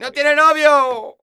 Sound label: Flamenco, Vocals, Male